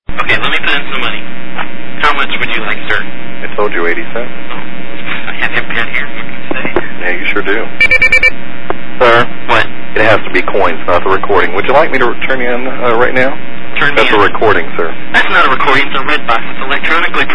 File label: red box; Annoying The Phone Company